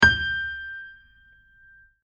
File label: G6 audio music note